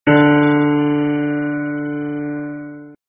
fail sound; If there was a pales

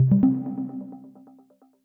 menuhit; 『Chip 1.1』

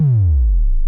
combo break; Night05

